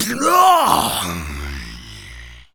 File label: Pain, Zombies; zps; Carrier